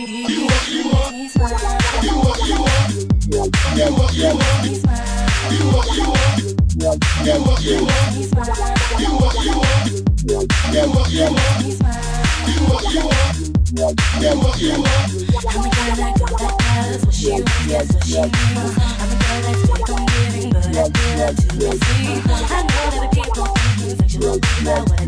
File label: Bassline